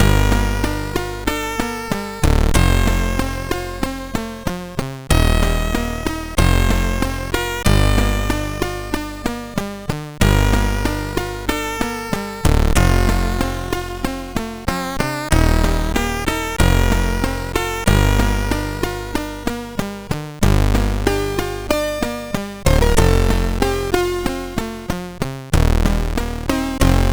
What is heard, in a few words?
Plotter Tune, CSG8580R5